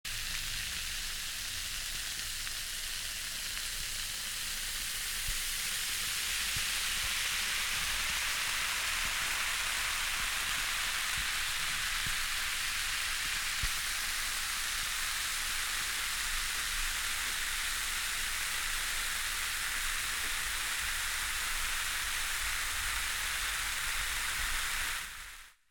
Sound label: Acid Bath, Scary Sounds; 1001 Sound Effects; Halloween Horror Sounds Effects